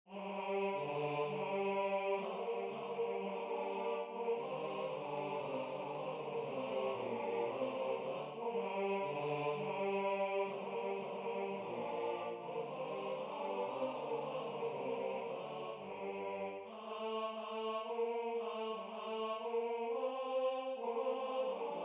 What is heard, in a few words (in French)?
soprane; La marche des rois; Lully